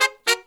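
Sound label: Pop & Funk Brass, 110 Ebm, right; Best Service ProSamples vol.25; AKAI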